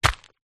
footstep single forest path track; zapsplat

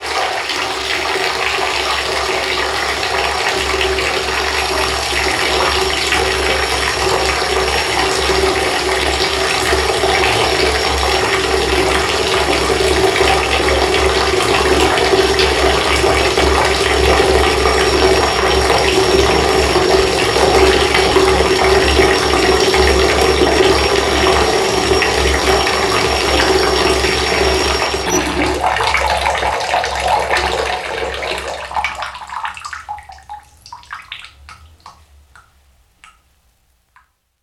household bath fill with water; sound effects one

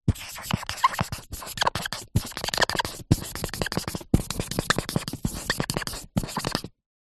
office marker large write fast whiteboard

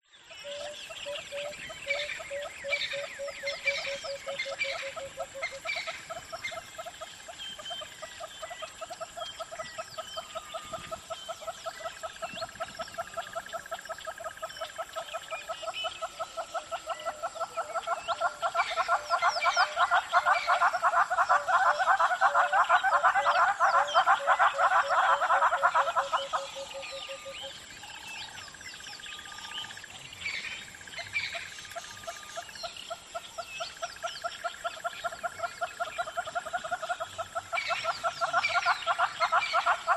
Bird Red-Billed Hornbill; audio hero

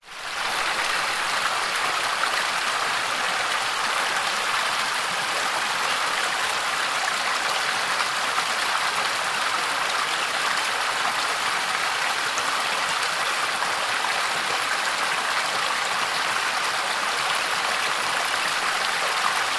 nature stream forest

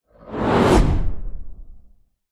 whoosh fast powerful; sound design; zapsplat

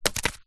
foley tree bark piece drop on ground; zapsplat

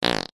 human fart

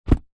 foley laptop case fabric empty set down carpet

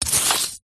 knife scrape dirt

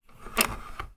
household heating thermostat dial turn

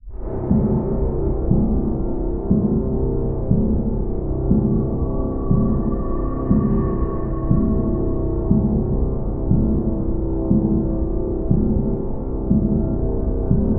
Drone Brass Rumble; bottlerocket

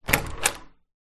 household internal door open handle lock release

